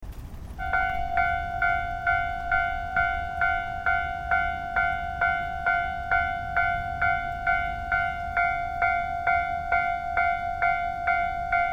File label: trains passing